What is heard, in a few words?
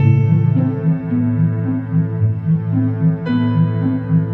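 strings instability, Sad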